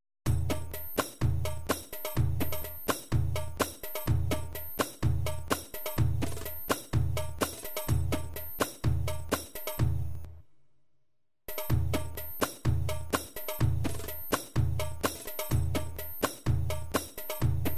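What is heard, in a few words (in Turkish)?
cızırtılar, setin bazı seslerinde cızırtı var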